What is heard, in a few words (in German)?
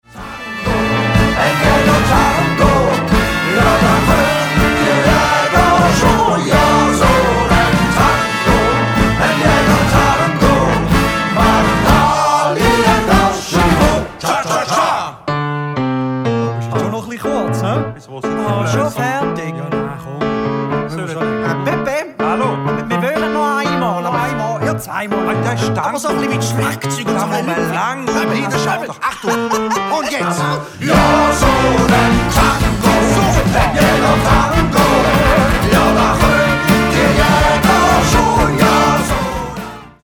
jaegertango; mit pauke und trompete